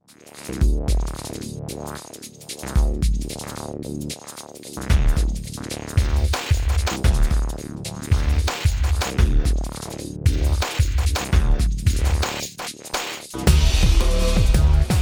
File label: electronica